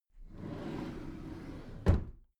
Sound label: packed drawer smooth close soft